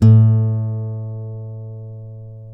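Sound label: A1 FLAMENCO, FLAMENCO guitar; WorldSounds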